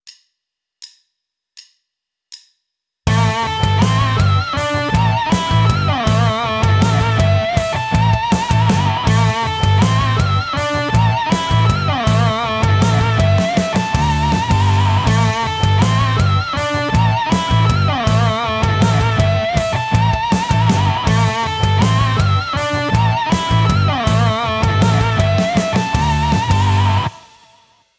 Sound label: Tapped Harmonics, guitar